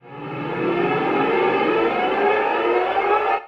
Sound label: NOIS; XXL 800 Production Effects